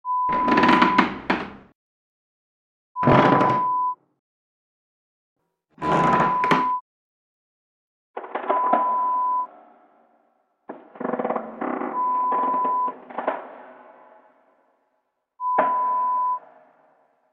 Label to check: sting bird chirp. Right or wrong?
Wrong